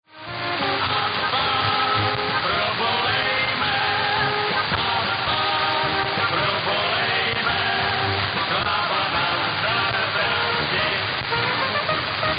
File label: Zbraslav 1233 kHz end; czechia